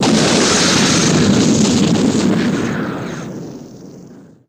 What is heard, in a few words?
Rocket Blast, Explosion And Flames; small rockets